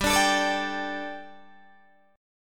G Suspended 2nd G, down, Guitar, DADGAD

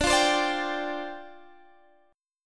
C Suspended 2nd Suspended 4th, D

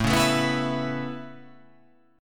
A Major Add 9th A, chord

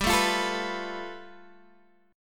A 7th Suspended 2nd Sharp 5th

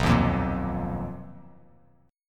B Major 7th Flat 5th B Bass Standard; BM7b5; strummed; JGuitar

